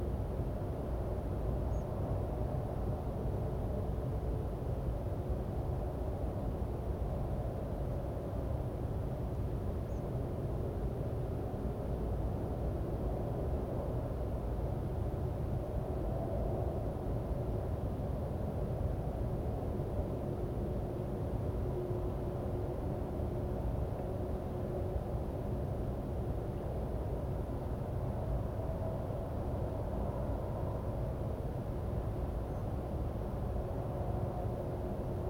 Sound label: Tree Pipit Anthus trivialis, Ladakh; Themisgang